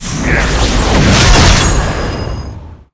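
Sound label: beatle king attack, monster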